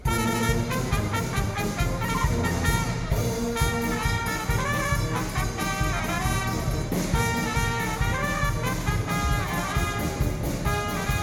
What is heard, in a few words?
orchestra